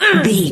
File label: voice male hurt pain